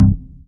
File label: DM BAS, Dance Mega Synth